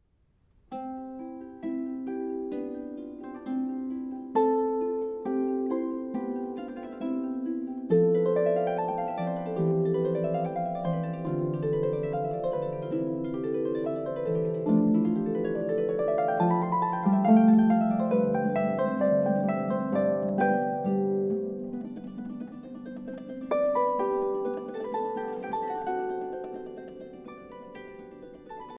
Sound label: arpeggio